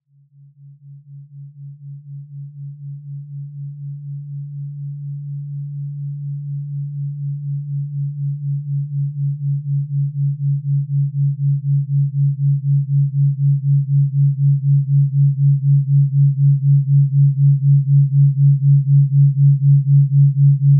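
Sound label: 4Hz Theta Waves, Binaural Brain Waves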